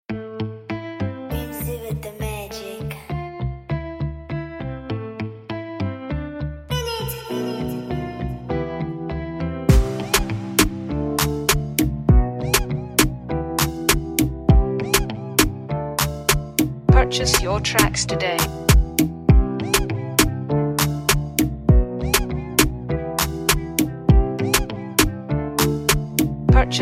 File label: Afrobeat Instrumental